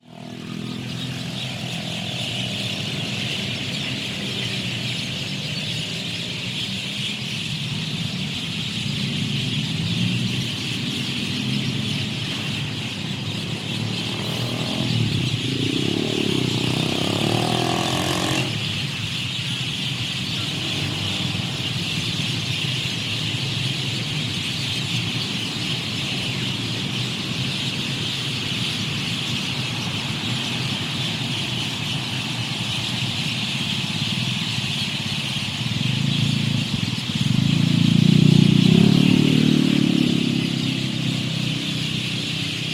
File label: hundreds of birds, Chiang Mai Thailand